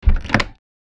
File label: Vintage door latch lock; household